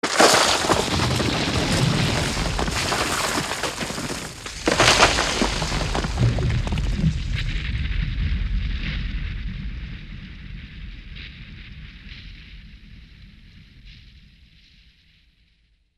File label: Rubble Landslide